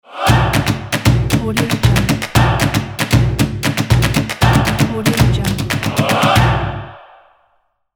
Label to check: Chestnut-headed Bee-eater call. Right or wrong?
Wrong